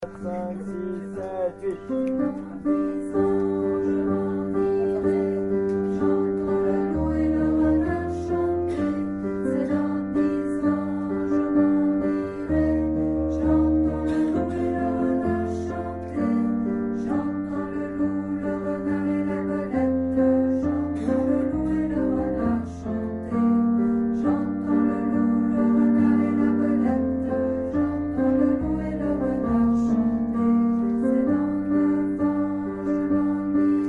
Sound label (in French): J entends altis; la jument de michao